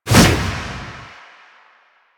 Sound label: Finisher Whoosh; Finishers; Enemies; Warframe; Kuva Lich